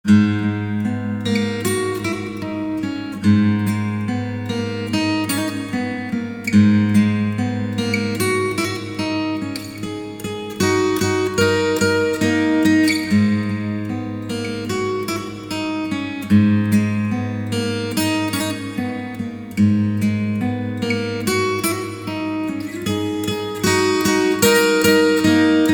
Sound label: instrumental